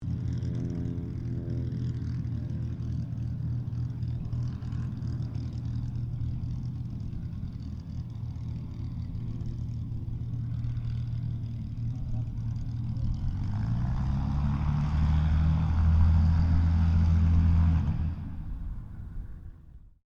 AIRCRAFT SUPERMARINE SPITFIRE MK-XVI TAXI SHUTDOWN; Airplanes; Vehicles; Airplane Sound Effects